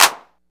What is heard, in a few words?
hand clap c sharp key, Royality free clap sound tuned to the C# note. Loudest frequency: 4400Hz; percussion; single hits one shots